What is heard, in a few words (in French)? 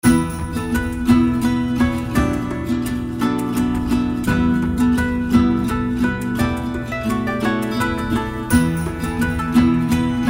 taverne